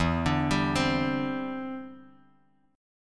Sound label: E Minor 7th Sharp 5th, down, Bass Standard; arpeggiated